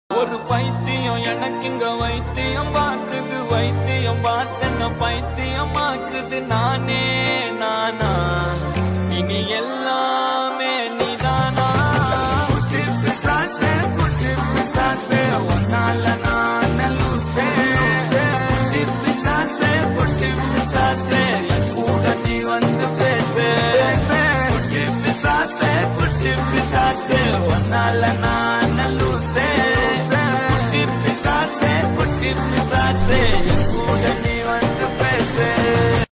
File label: tamil love ringtone; kutty pisasae